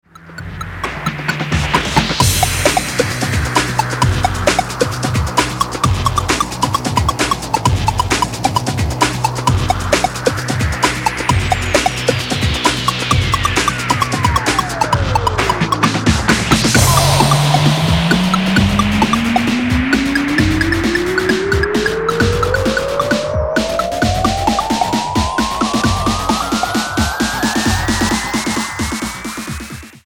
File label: disco special radio